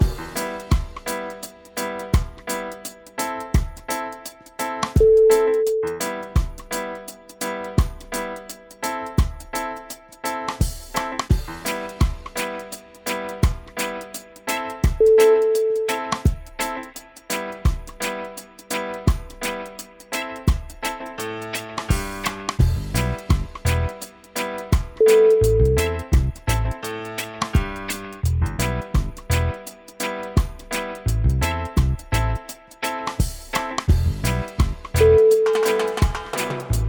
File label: reggae